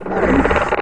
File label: Knockback Pull; Seer; GamigoNA